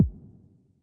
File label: snap, Yamaha Tenori-On Snapkit